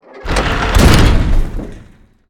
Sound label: very large door close; svencoop